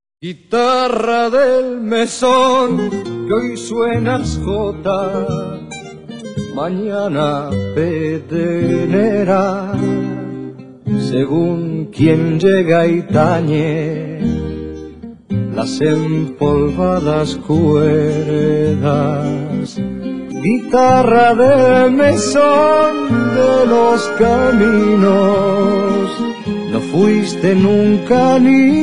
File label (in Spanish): guitarra